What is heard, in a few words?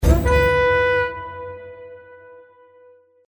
horn; Champions of Valhalla